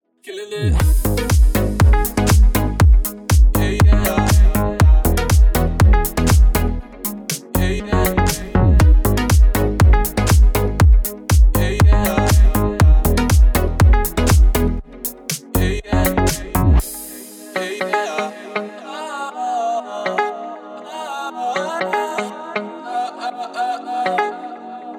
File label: Original Mix Ringtone